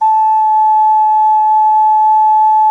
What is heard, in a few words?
SOP A, GEMSHORN; SoundBytes - Early Patches